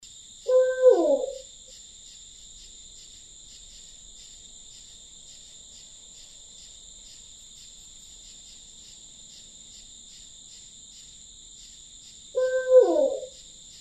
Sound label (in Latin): strix varia